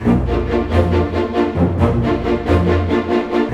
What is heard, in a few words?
TANGO, 142; East West - Scoring Tools; ORC Tango Happy